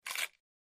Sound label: Bite Vegetable Peppe